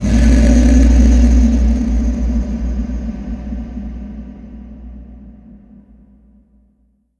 coralriff death; Crypt of the NecroDancer

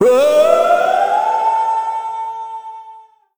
trapdoor; Crypt of the NecroDancer

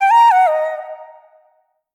blues queen sing; Crypt of the NecroDancer

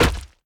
cauldron hit; Crypt of the NecroDancer

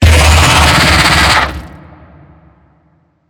worm roar alt, creatures; Factorio

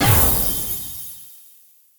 spell riches; Crypt of the NecroDancer

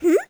hm; Crypt of the NecroDancer

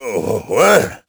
confused; Crypt of the NecroDancer

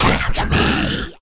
deathmetal skels; Crypt of the NecroDancer

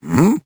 hm; Crypt of the NecroDancer

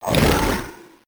tarmonster death; Crypt of the NecroDancer

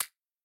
inventory move; Factorio

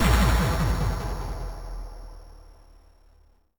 player death; Crypt of the NecroDancer

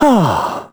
heal; Crypt of the NecroDancer

